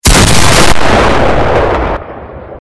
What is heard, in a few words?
shotgun loud